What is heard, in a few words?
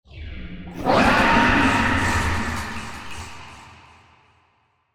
Digital Creature High Pitch Scream, Monster Sounds; The Decline Of Warriors; Monster Sounds Volume II